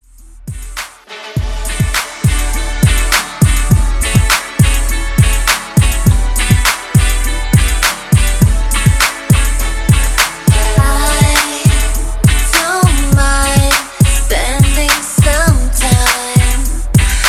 ReDrum Top40 102 BPM

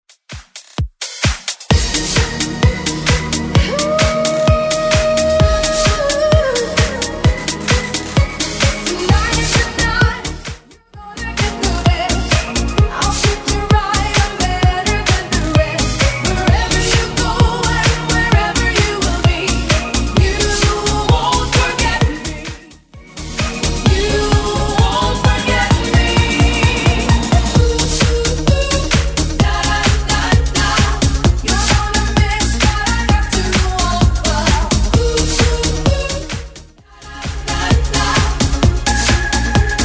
90s Redrum 130bpm

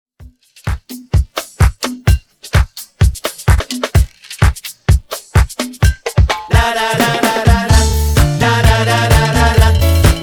128 Bpm; Redrum

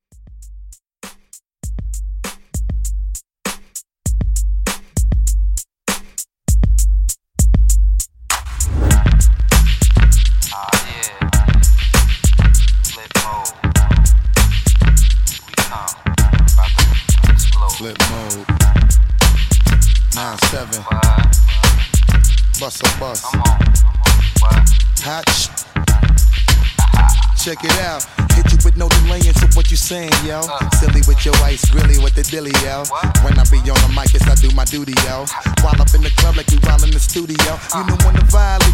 90s Hiphop Re-Drum 99

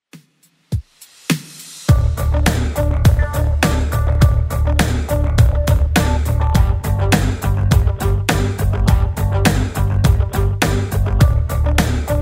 2ks Redrum 103bpm

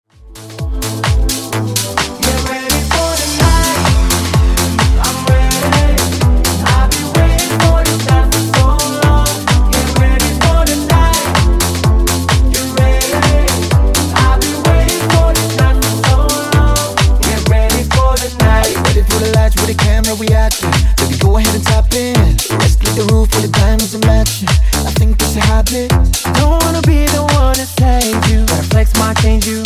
Funk Bootleg 128Bpm

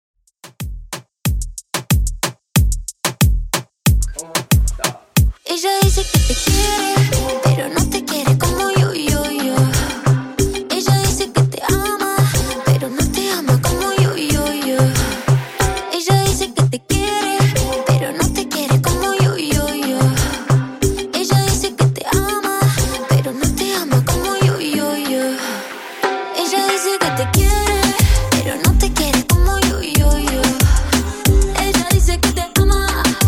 Reggaeton, 92Bpm

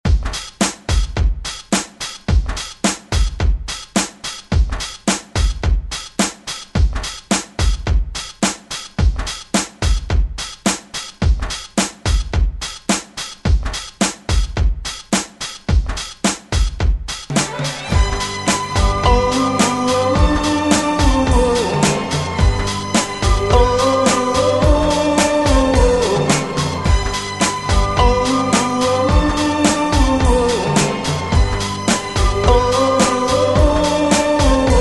Re Drum 107 Bpm; DJDOLLS

